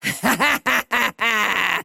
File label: laugh; dota; muerta